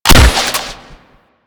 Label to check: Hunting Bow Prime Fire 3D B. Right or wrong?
Right